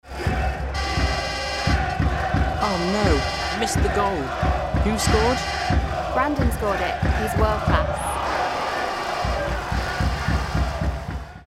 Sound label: Stadium